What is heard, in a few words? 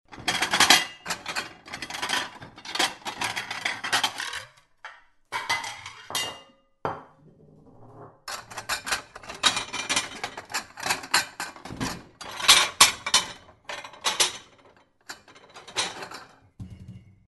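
sounds of table plates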